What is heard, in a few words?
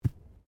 Body movement Ground Impact Light; Body movement foley to simulate falls and deaths; Wrestling And Struggling Sound Effects